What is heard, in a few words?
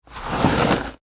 Sports, Baseball, Slide, Into, Base